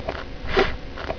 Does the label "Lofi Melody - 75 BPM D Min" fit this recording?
No